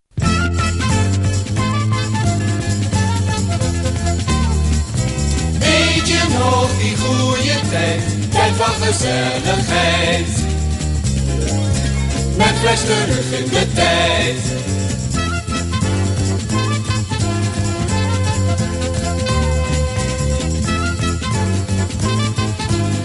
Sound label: jingle; Flash